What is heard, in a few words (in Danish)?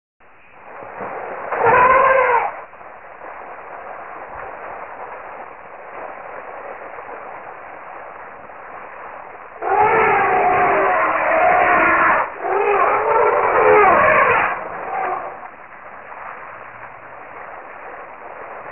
Elefant